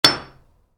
Hammer stone, Hammer: Impacts Stone; Tools; Stuart Duffield

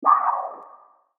Dark Magic Entrapment Spell; Magic Sound Effects; fantasy sound effect; role playing games, magic videogames

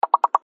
Button Toy; Miscellaneous Toy; Cartoon; Toys; Blastwave

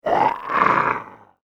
Undead Zombie Chomper; Zomby Sound Effects; horror; Andrew Fleming

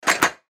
Button Multimedia; Web Interactive; Technology; Buttons; Blastwave

